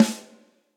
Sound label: Snare Hit Cold Tight Room; Drum Hits; Standard Hits; Ableton; Factory Packs; Session Drums Studio